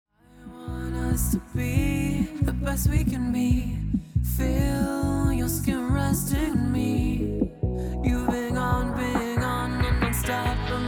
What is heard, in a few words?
Singing Reel